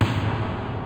horror; sprunki raddy pack

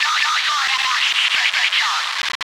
new corrupted woody alert; pp3 catnap n grabpack; slender; corruptedwoody3

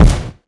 catnap footstep; sw nightmare; simba garten of banban7

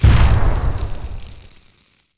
Laser SHOT; multiverse scientist; SKIBIDI

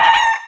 leap, chicken; zoochosis

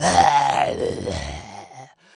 amp death; The Monster Plane; kolmods death

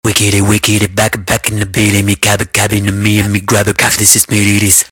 panic; Garry's Mod; sprunki secret; sprunki el cool

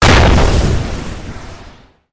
rpg fire; undead toilet

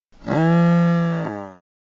MOO; zoochosis; deep sea; lazynap

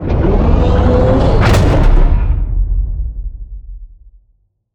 megamek move; skibidi toilet emperor

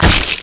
axe, melee; The Monster Plane; kolmods melee

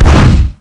punch; The Monster Plane; kolmods melee

